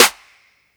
Snare, drums; One Shots; Ableton Live 12 Lite; Core Library

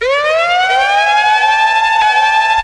Siren Cookin

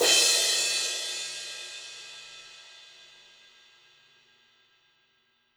Crash Dry Session Hard, Cymbal; One Shots; Drums; Ableton Live 12 Lite; Core Library

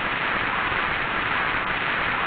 Rain; Open Zelda; Quest Designer 2.0.4b

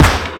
FX Punch; One Shots; Drums; Unsounded; FX Hit; Ableton Live 12 Lite; Core Library

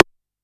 Rim Fenk Ring, Drums

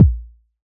Kick Low Machine

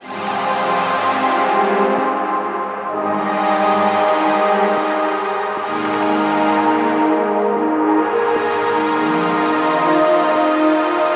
Atmos F 172 bpm, Synth; Loops; Tonal; Ableton Live 12 Lite; Core Library

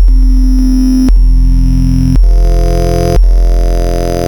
Retro Percusive Organ; Ableton Live 12 Lite; Vector; Sprites

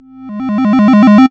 computer; Game Maker 8

